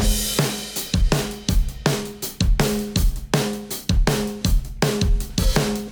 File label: drum bus after; Smasher